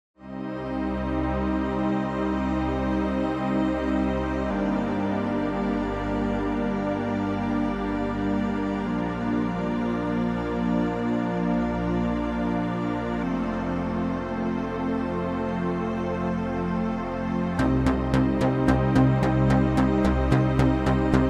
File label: italo disco